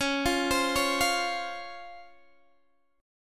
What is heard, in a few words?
C sharp Major 7th C sharp Guitar Open D